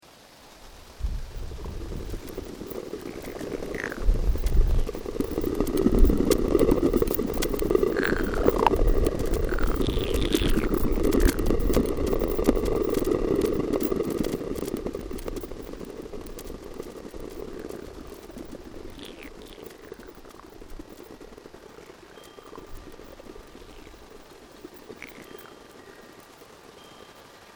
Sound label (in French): vent particularise